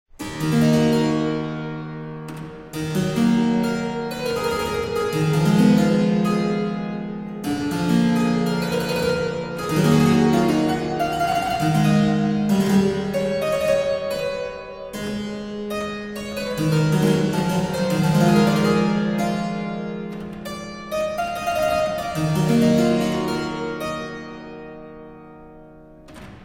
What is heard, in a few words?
partita d moll prelude